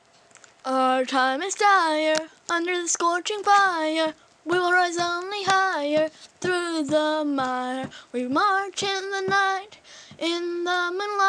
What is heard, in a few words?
funeral hymn